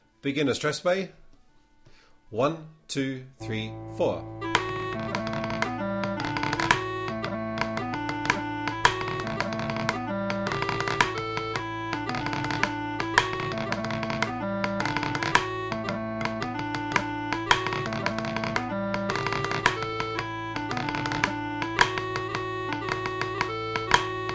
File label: snare left pipes right pad